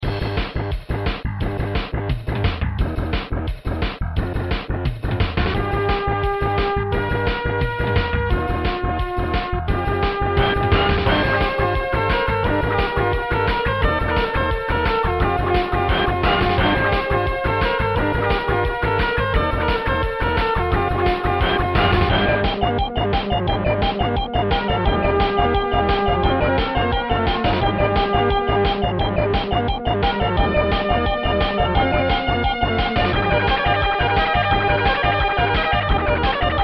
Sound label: Music Underwater Lab